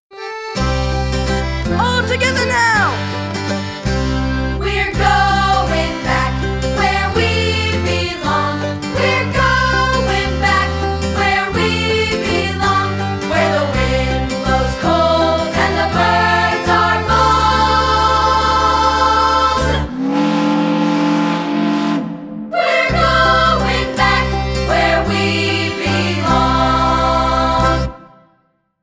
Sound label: Sea Shanty, rehearsal tracks